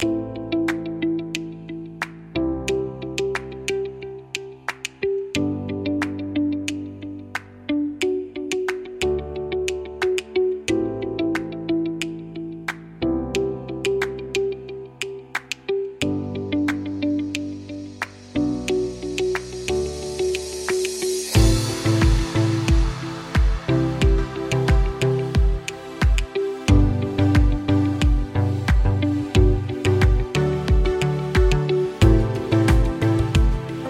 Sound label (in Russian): muzyka dlya instagram